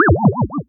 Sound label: Bouncy Sound